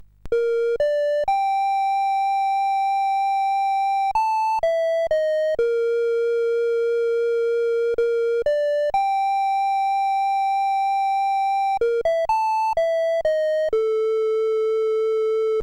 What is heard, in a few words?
8-bit sid CSG8580R5; Civilization